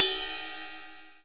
RIDE BELL 1; Greytsounds; Scott Peers Sound Engineering

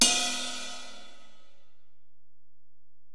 Ride, Alesis DM-PRO, Drum Machines

